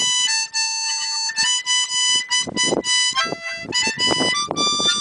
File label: Harmonica